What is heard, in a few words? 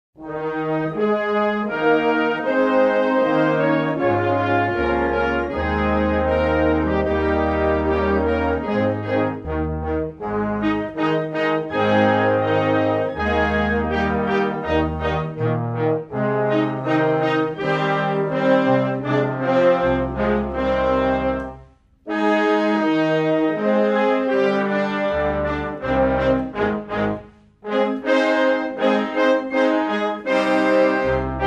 anthem